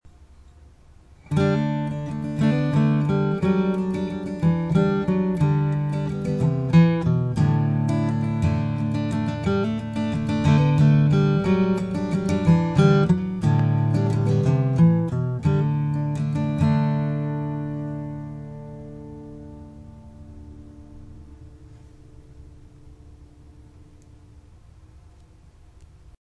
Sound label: guitar test